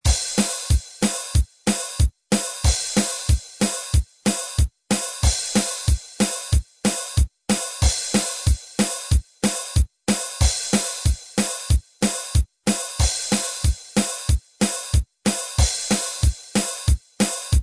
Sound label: example two four time beat